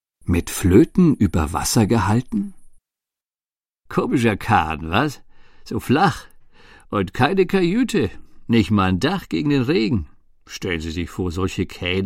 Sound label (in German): floete